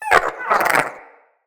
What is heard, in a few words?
Wyrm Idle, Pets, Vocals